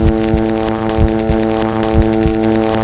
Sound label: bang; allegiance